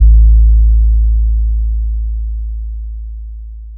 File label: Sub, 808s